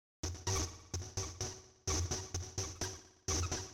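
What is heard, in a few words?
dephazed monotron F sharp, synth; instruments; loops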